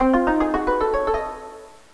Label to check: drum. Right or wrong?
Right